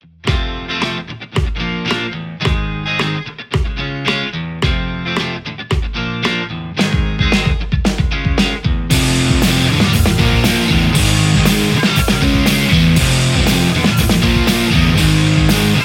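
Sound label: Stratocaster; igniteamps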